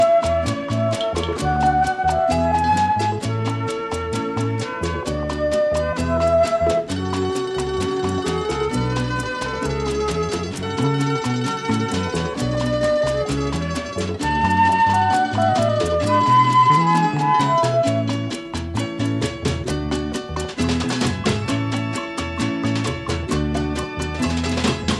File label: Instrumental